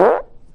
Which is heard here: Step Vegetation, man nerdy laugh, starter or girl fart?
girl fart